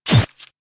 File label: sword